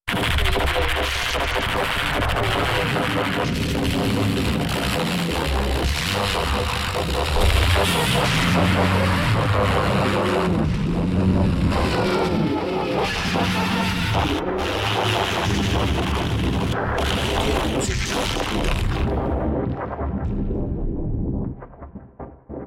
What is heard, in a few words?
zapping space; travel; the sound pack tree